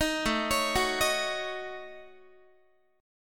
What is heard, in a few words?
A 6th Flat 5th A Guitar, chord; A6b5 arpeggiated; 18,17,16,16,19,x